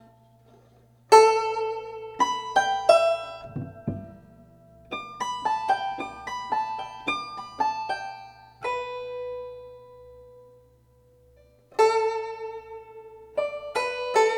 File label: guzheng improv